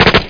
steyer; SpecOps2MPlayerDemo

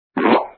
Dent

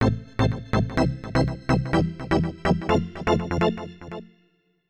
ORGAN VOCAL 125 A; Keys

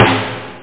snare

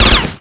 vektor; SpecOps2 MPlayer Demo

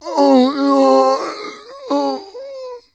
PAIN HANG; Messiah; HARPOONED

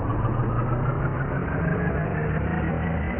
car accel; 3D Game Programming All in One; KOOB

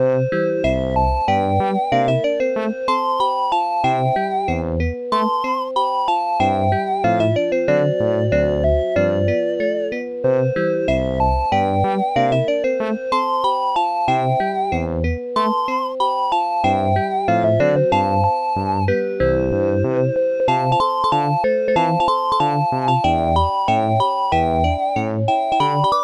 tune; wally; arcade

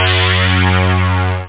BUZZ; CU Amiga Super CD-ROM 14; Amiga 8-bit Sampled Voice

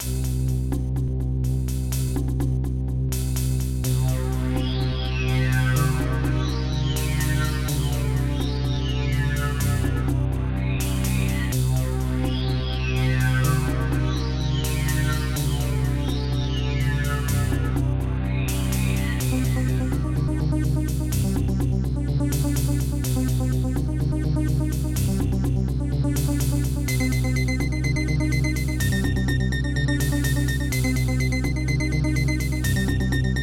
ambiance; SoundMOD Volume 2

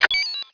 part button; Star Wars DroidWorks

